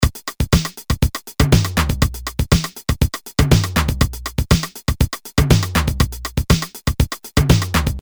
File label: Samba, Boss DR-220A; Preset Patterns